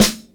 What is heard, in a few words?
normal hitclap; osu!